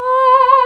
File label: soprano C4